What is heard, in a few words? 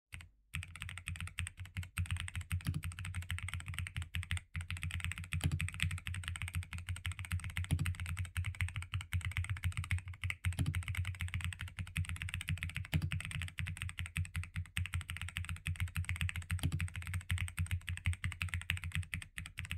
ducky one x mini